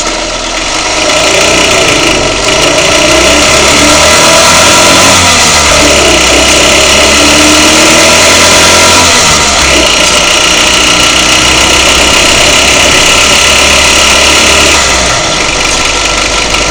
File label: accelerate to 4th, honda 600 coupe